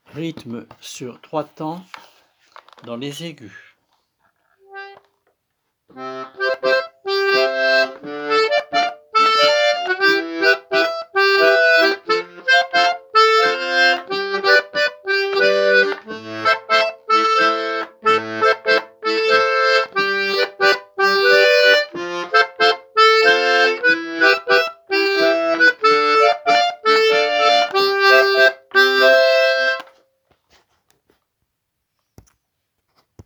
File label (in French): Rythme sur 3 tps aigu; AkoOdamVoBitola